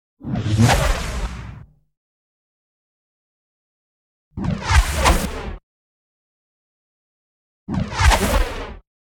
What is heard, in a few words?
Sea Serpent Tail Whip lashes; 3 Versions; Sound Ideas; Series 2000